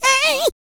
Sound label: E BIT, MALE HUMAN; Spectrasonics Vocal Planet